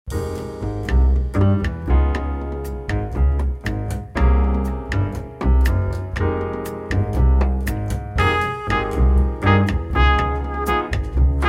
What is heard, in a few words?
Fumba Rhumba